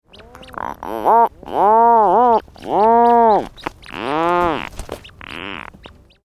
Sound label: rhinoceros auklet; ringtone